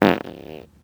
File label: Medium Deep Average Juicy Bubbley Speaking Explosive Rhythmic Beefy Forced Froggy, Farts; 1000 Winds Project